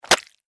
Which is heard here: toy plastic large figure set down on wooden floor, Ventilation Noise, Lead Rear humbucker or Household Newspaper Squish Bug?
Household Newspaper Squish Bug